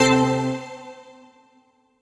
intro Worlds; todee and todpee

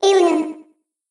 Alien

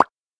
jump; todee and todpee

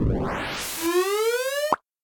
win; todee and todpee

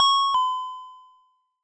Ding

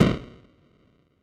shot; todee and todpee

